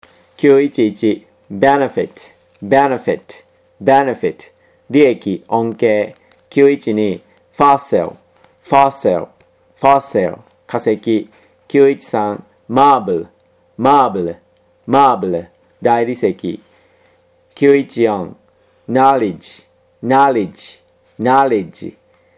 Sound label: tango